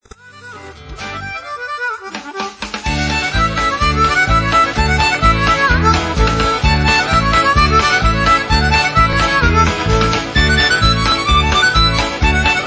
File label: Harmonica